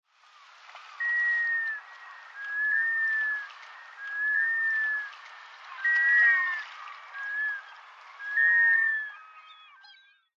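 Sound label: kurogamo, Black Scoter; nemuro; koe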